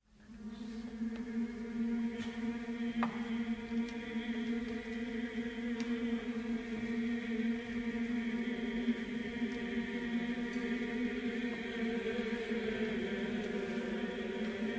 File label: Requiem for Soprano